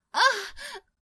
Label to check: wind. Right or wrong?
Wrong